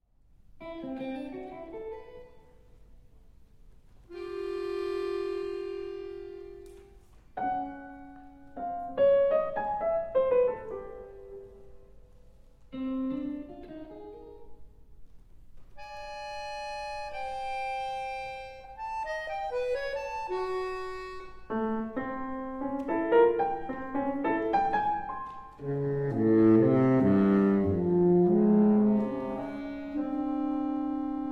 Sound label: Accordion, Electric Guitar, Baritone Sax and Piano